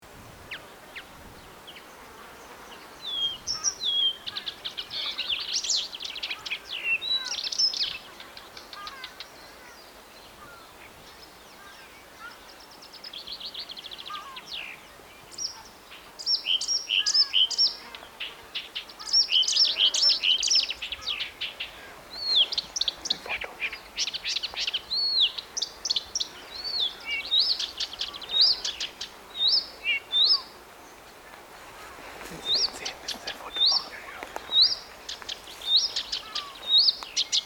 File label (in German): buschrohrsaenger